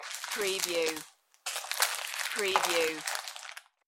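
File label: Plastic Wrapper Crinkle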